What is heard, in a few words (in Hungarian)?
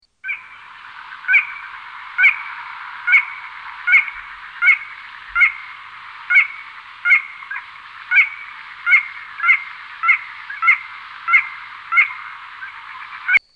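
Kis vízicsibe; Madarak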